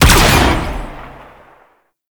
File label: Blastor Pistol Shot